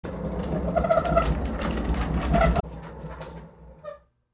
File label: Amps and Speakers; Big Room Sound